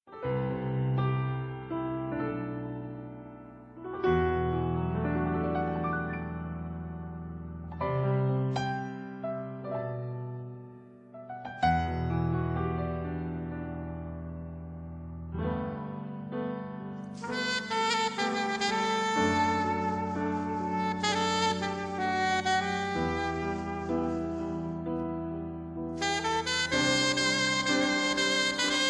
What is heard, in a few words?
sax; solo